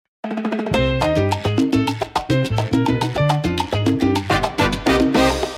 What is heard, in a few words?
Salsa Mambo